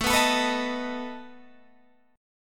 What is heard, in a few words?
A Suspended 2nd Flat 5th A down Guitar Open G